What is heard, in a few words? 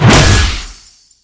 shoot end; cstrike; weapons; Astra